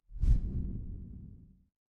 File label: transition whoosh